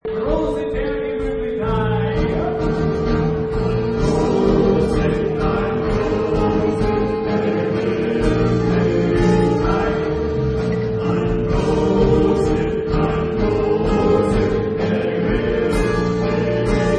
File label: German Toasting Song